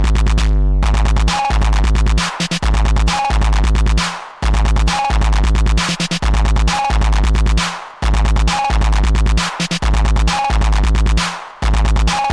locked groove

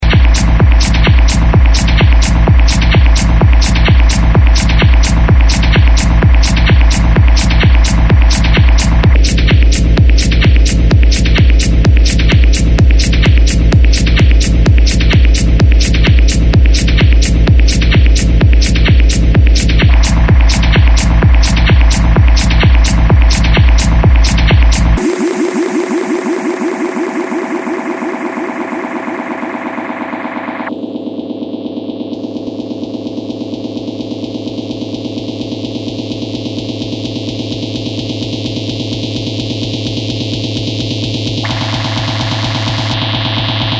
sine saw

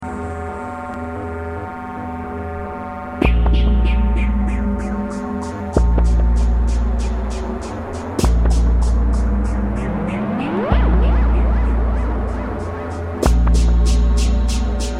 soviet drum brain attack

